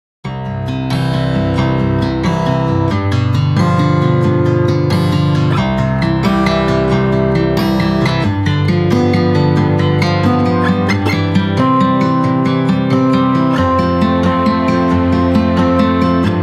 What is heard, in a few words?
folk music